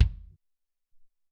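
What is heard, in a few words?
Solid Kick 2, Alesis SR-18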